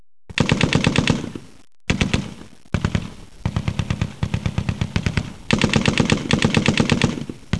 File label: machine